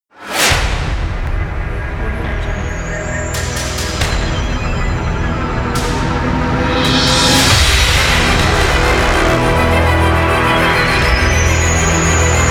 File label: aggressive cinematic logo